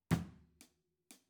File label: Ride